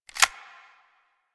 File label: Lever Action Rifle Reload, Weapons; Warframe; Tenno